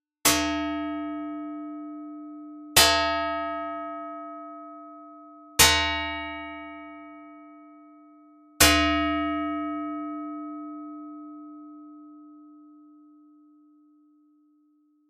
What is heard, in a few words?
gong